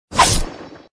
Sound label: sword, battle